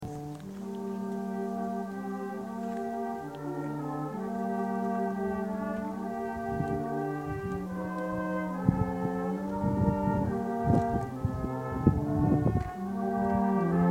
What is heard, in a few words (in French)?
MUSIQUE PARTISANS; SAUZE